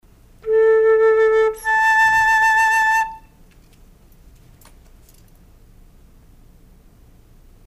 flute octave

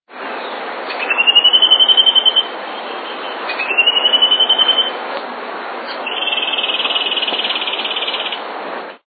Long Billed Gnat Wren, BIRDS; phy103

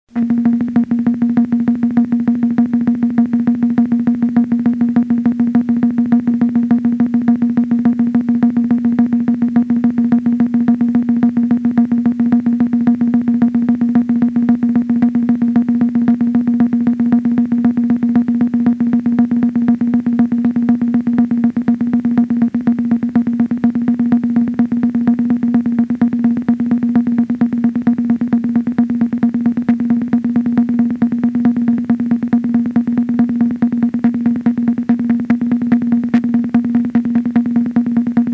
beat, Rhythm

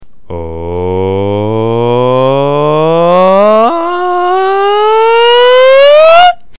falsetto

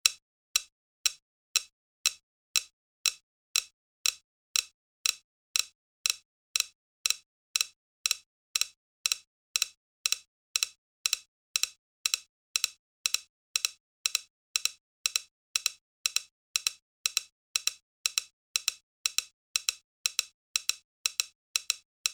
Phase Long

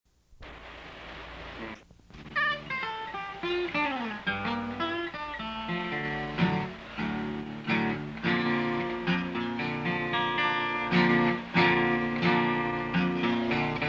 Tuning

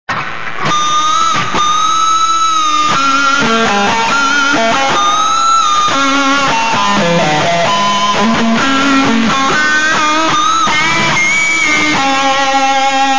harmonics

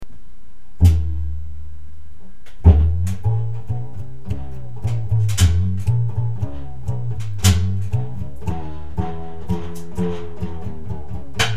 washtub

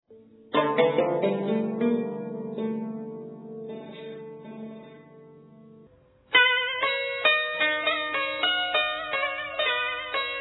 chordophones

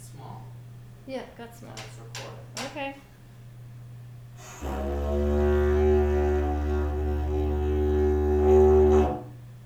digifu room

